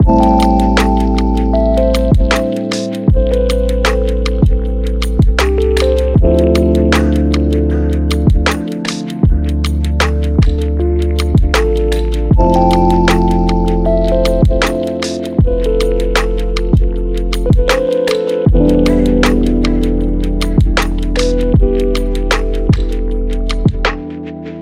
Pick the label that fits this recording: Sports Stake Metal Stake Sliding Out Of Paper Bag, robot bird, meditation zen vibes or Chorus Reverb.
meditation zen vibes